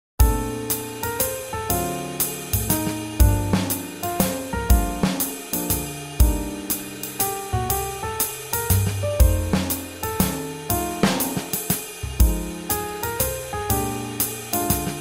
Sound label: Swing Jazz